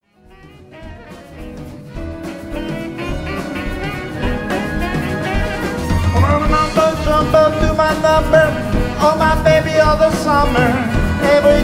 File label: Mellow Saxophone